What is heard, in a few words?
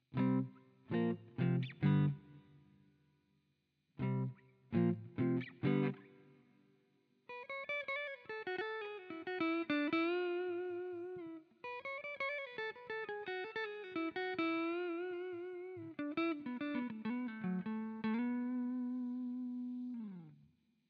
room hall